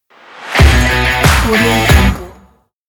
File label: short catchy rock logo